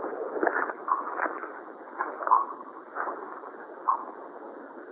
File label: knocks; summer shade